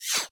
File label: menu direct click; 《CK》 WhiteCat 3.0 ~ DT